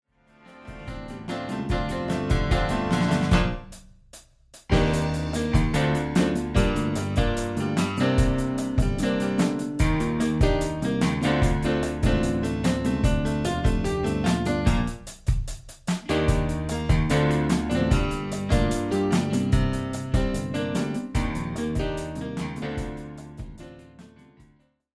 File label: piano; Promo 220 Music School; extrait piano